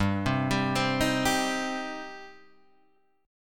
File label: G Suspended 4th Sharp 5th G, down, Guitar, Half Step Down